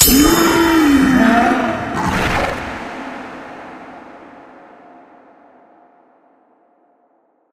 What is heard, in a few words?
medal click rare; ui; yeti